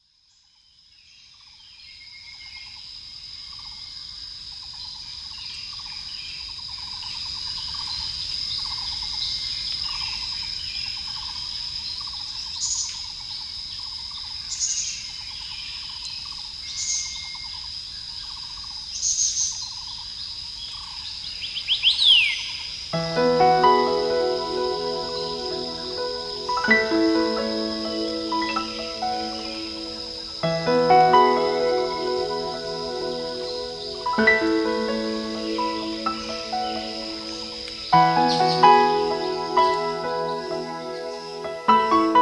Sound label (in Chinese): 大自然音樂 Nature music 晨歌 Morning song